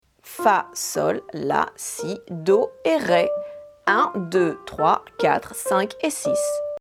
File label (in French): VI ème degré en ré